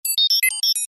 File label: beep no